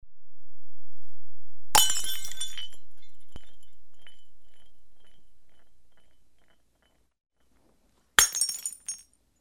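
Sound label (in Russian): винные бокалы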